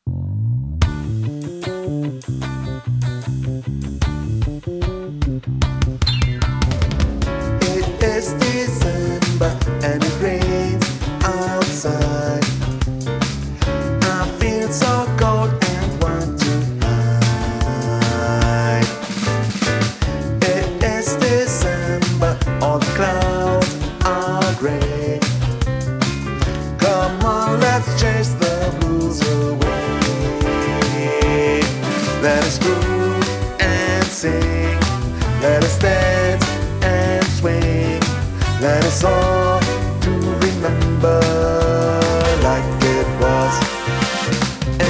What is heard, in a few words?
Bass